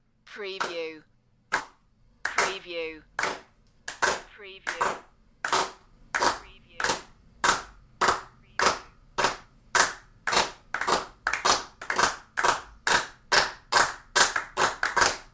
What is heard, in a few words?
Applause Small Crowd Clapping in Sync